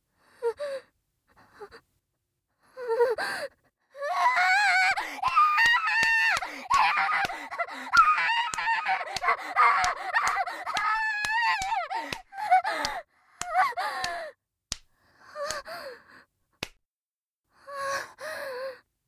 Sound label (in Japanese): ガチ抵抗